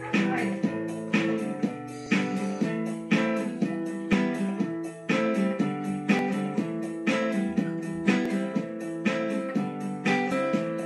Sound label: Piano Guitar